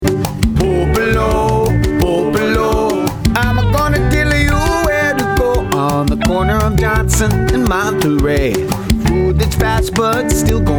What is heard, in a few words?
Jingle